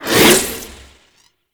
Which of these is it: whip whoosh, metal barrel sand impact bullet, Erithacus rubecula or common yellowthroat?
whip whoosh